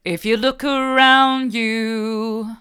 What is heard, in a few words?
hard, vocal